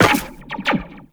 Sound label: Tenno Run Zipline, Footsteps; Warframe